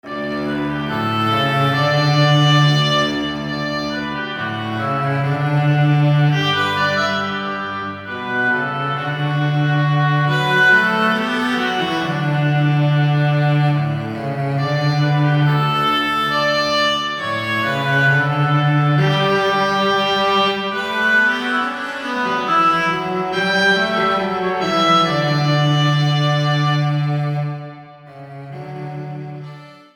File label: Cello and Oboe